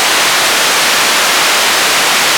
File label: WHITE NOISE; Zero-G Ambient 1